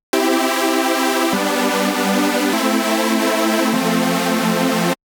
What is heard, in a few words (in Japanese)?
シンセ